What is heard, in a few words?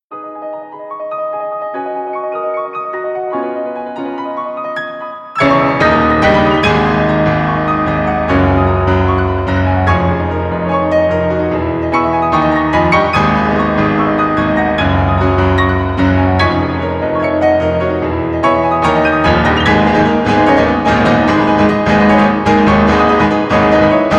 Piano Duo